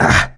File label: hurt minor; survivor